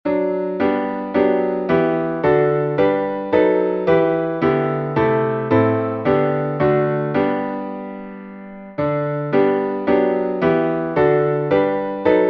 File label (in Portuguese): salmo instrumental